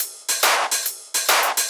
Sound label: Shuffled Loops, 140 BPM